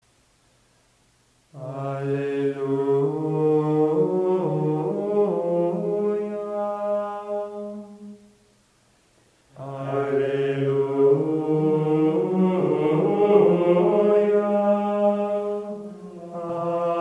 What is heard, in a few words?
Alleluia Pascha Nostrum; Easter Sunday